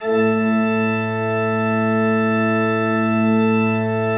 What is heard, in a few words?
A1, Cathedral Organ; Propeller Island